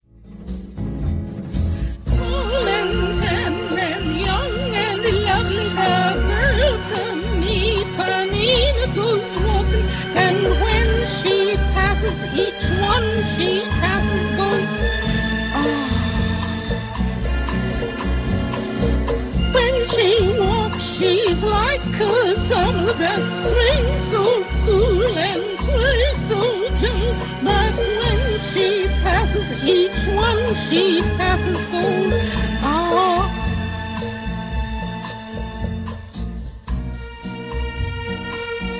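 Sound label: Brass Playing Mishaps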